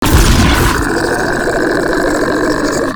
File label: Flying Mouth Attack Inhale; Enemies; Warframe; ManInTheWall